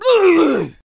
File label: Death Vocals; Squire